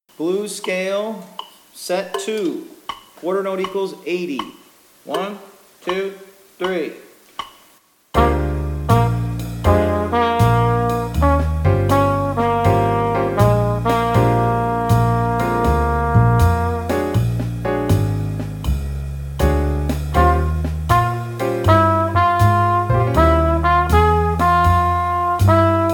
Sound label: Play along Blues Scale (with rhythm, qn=80); C Blues Scale, Set 2; with rhythm section; Chwalik